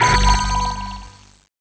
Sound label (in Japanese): 扉出現; ＢＢ二択扉出現; super otousan